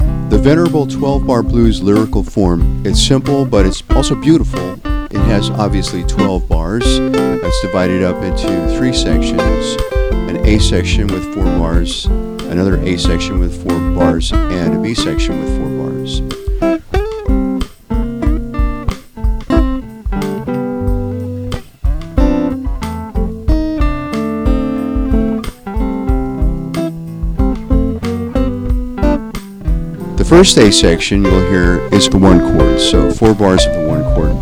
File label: blues lyrical form